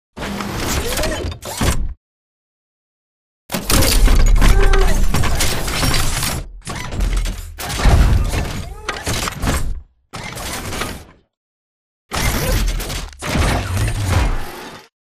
bt approach start; Titanfall 2

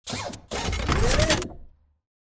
bt combat walk to idle; Titanfall 2

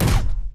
Footstep Jog Close; Titanfall 2

